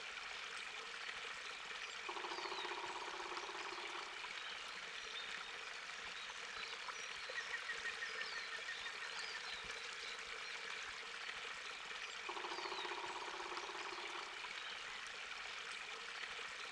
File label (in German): Bach und Specht